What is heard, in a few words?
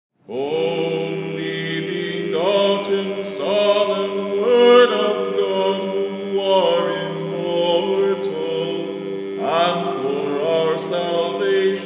Only Begotten Son, divine liturgy